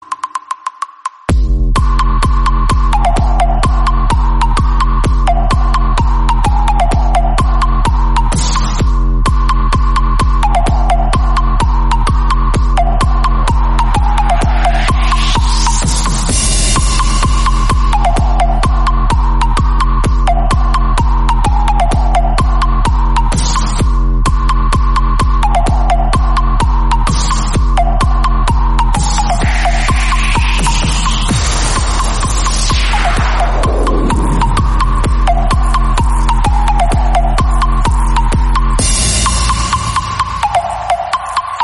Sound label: trance ringtone